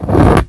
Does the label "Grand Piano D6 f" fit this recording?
No